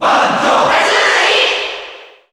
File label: Cheer Japanese; Banjo & Kazooie; SSBU